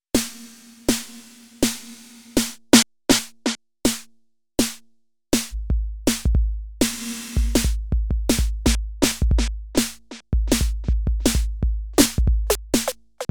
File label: delay and reverb